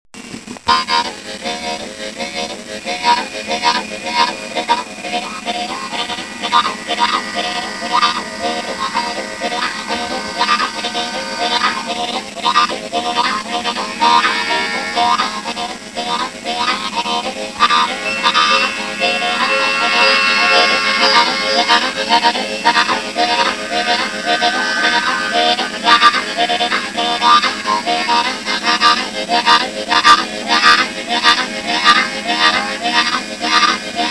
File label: train, blowharp